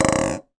foley bauble decoration christmas down bounce fast table; zapsplat